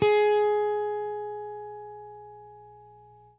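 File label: jazz line, guitar; Quantum Leap - Guitar & Bass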